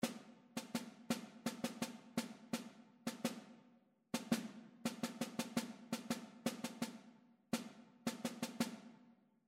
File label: rhythmic error detection